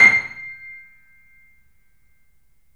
C -R, The Piano; InVision Interactive - The Piano

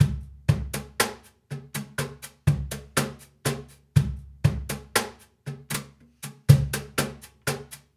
Cajun, Percussion, Drums; Essential Percussion

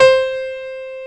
PIANO C5; POP PF

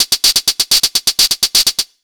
126 DRM, Disco House; USB Soundscan vol.20; Fresh Disco House I; AKAI; 126 BEAT

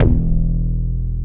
D10, Synth Bass; LA Syn Bass

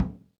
SURDO; Latin Drums&Rain

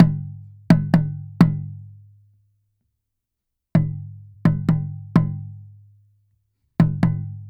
Small Taiko, Asia; Sonic Foundry (Sony Creative Software); Continental Drift Aftershocks

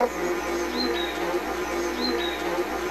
NATURE; ENVIRN-AMB

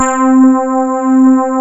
HARD RHODS C3; East West; Global Sounds; D50

